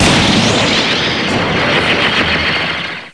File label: blast